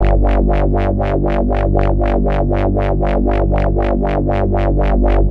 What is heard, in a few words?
wub wub